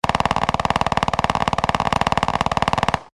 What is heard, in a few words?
machine gun burst distant perspective; AK-21